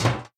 ladder zombie

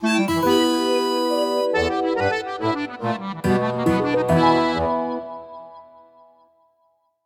Pirate Reward

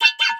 Reload; Wizard Mini

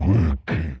Grunt

